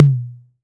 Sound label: Tom; Ableton Live 12 Lite; Core Library; Drums